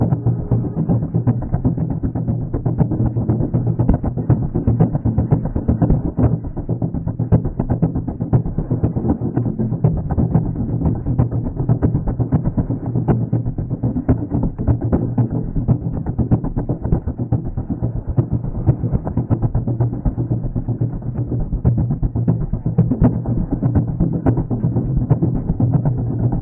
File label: Drums; Blue Horizon